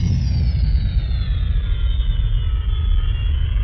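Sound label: engine decelerate xwing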